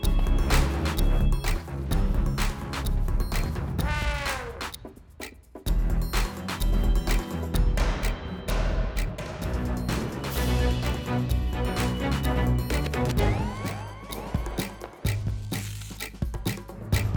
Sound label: Dramatic Music; 101 Dalmatian Street Boom Night Rescue